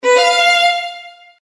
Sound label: click; クリック06 クリック。